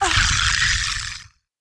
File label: dr die, dragon rider; propack; magic